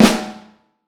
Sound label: Vintage Snare Drum, 6.5X14